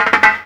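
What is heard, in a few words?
snare roll, Ragga & Dub Reggae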